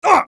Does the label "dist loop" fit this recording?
No